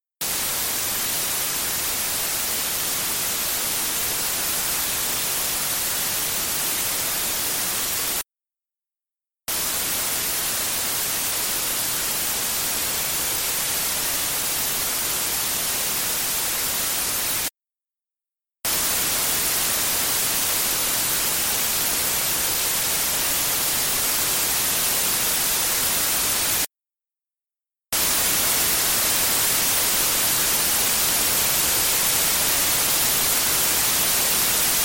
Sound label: wind; после посадки; омодулировано белым шумом